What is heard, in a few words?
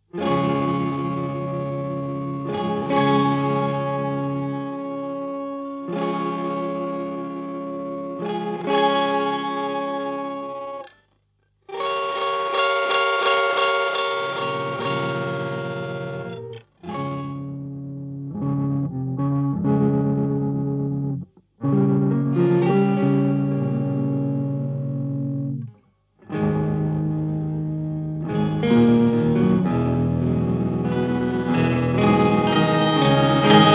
git oct